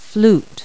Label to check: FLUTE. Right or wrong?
Right